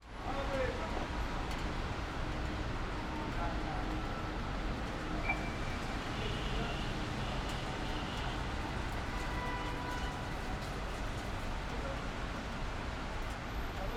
Istanbul, City ambience, Traffic, Turkish voices, Raining lightly; Sound Ex Machina